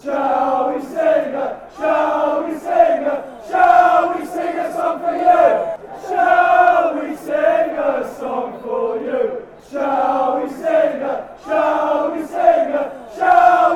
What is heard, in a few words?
shall we sing song you, fanchants; ncafc